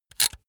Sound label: razor retract comb hard; soundpool